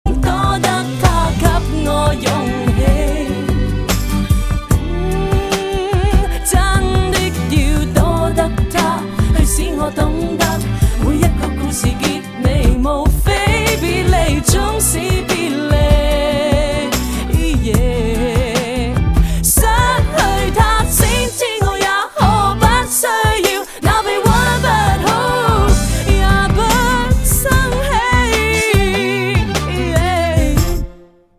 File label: mp three ringtone